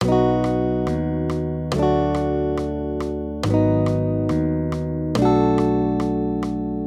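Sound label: Guitar, Accompaniment, Major Triads, B-D, 140 BPM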